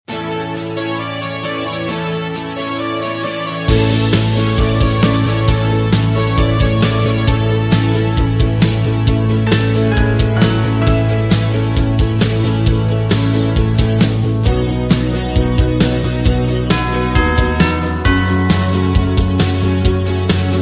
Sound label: (A) No Backing Vocals